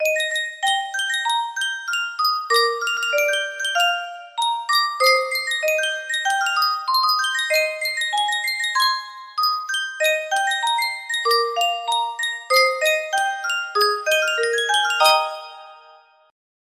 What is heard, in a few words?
yunsheng music box, music box melody